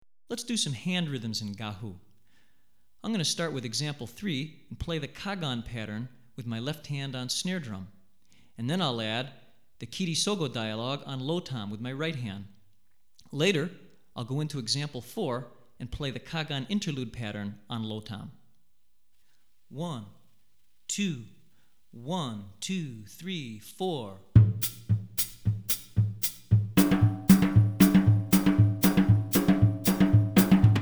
Gahu Hand Examples, West African Rhythms For Drumset; Hartigan